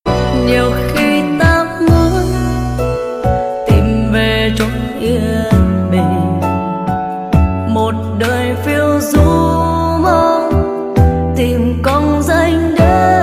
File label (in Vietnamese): giong nu